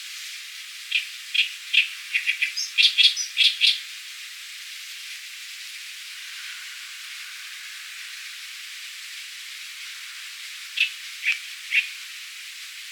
Acrocephalus orientalis

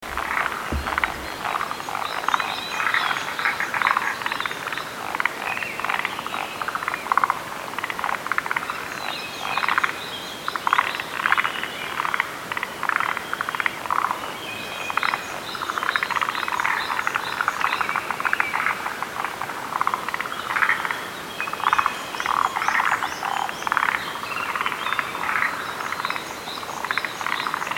Rhacophorus arboreus

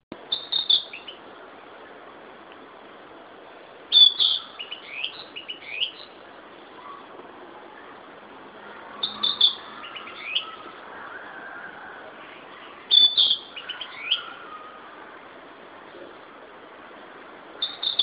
Ficedula narcissina

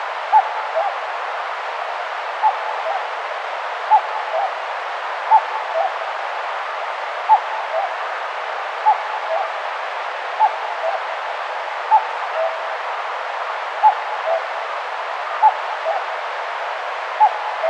Cuculus canorus

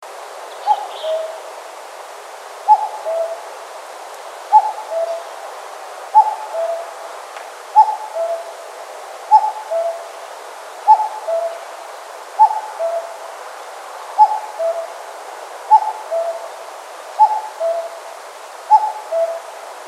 Cuculus canorus

